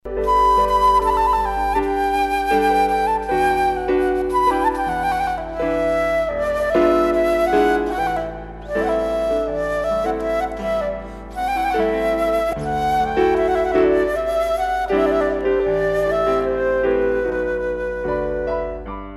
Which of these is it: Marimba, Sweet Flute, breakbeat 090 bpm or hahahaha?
Sweet Flute